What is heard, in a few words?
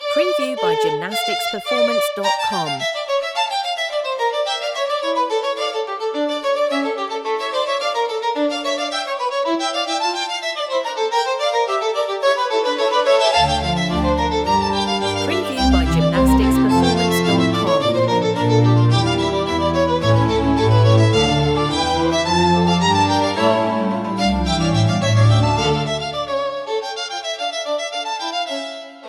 violin dance